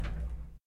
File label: Hammer little; Puddle; puddle plus ios gamerip 2018